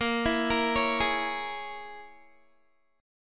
A sharp Minor 7th Flat 5th A sharp, Guitar 4E,4A,5D,5G,5C,6F; A#m7b5 arpeggiated; 18,19,20,18,20,x